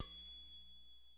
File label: quche, Bells